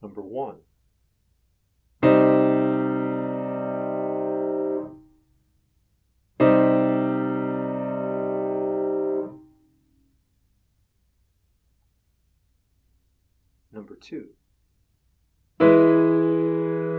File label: chords